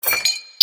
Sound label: idol pickup; ninjarun